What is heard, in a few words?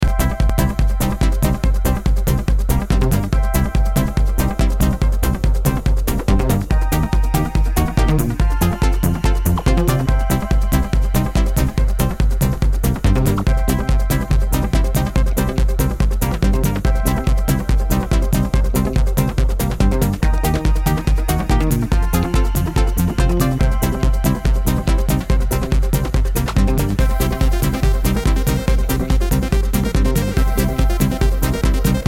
bgm totem medium; KarmaZoo